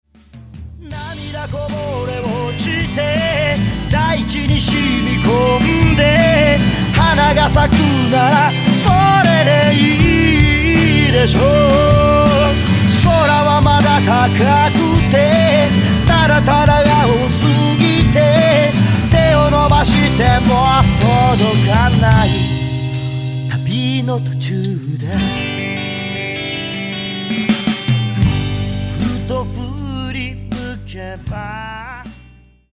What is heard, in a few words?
music